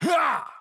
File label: Attack Large